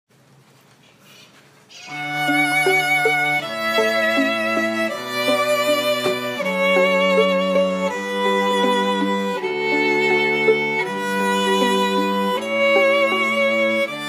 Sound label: canon in d quartet